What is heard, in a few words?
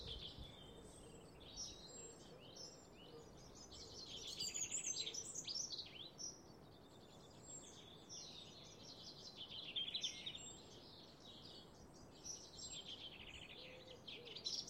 birdsong from an early spring evening